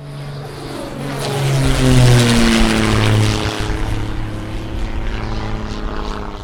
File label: airplane